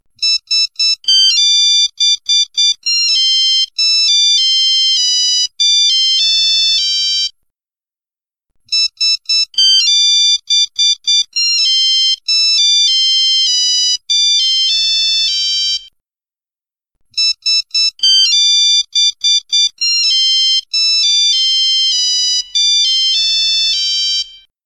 nokia classic tono de llamada años 90

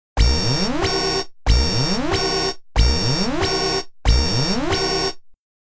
nuevos sonidos de nokia reloj de alerta

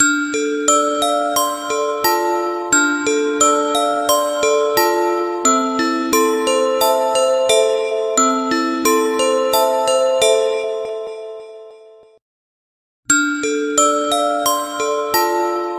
tono tranquilo

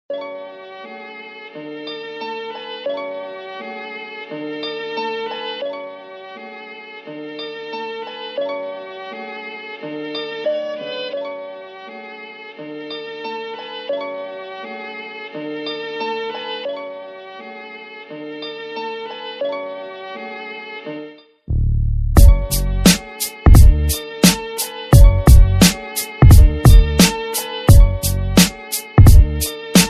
oldrap de los anos 90

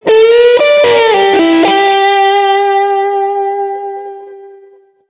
guitarra electrica

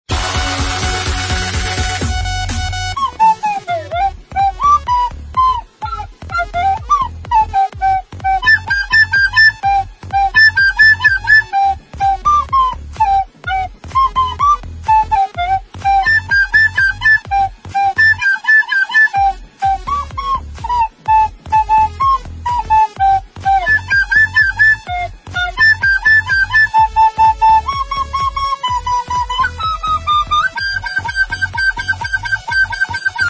flauta

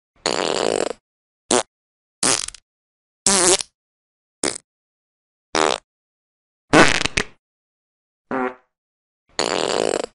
gases chistosos

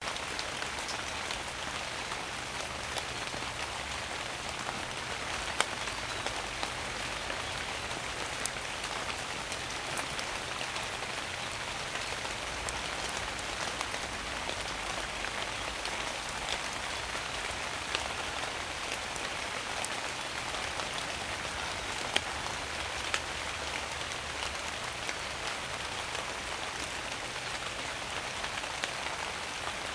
sonido de lluvia

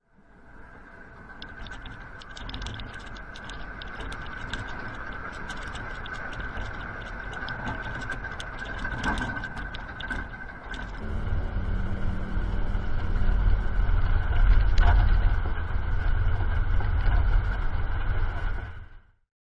terremoto de japon

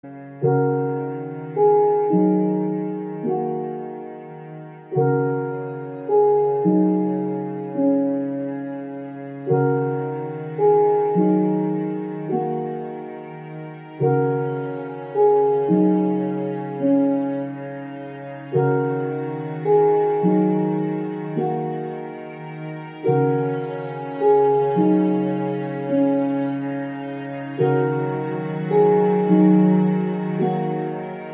sonido suaves

